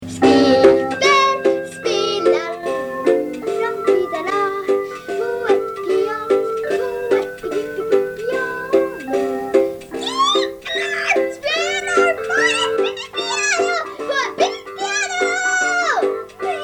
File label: skippers piano